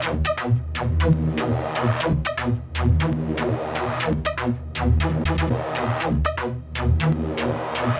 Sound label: Synth